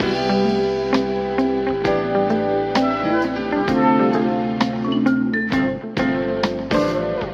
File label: keys uplifting; Loops; Phonky